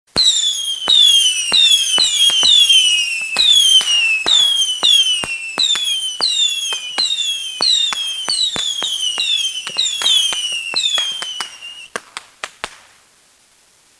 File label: Fireworks